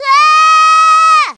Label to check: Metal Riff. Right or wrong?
Wrong